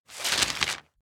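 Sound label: paper opening transition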